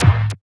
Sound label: Zappy Kick; Sonic Foundry (Sony Creative Software); Esoterik Beatz; Zappy Kit